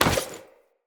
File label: Player Short Sword Catch; Weapons; Swords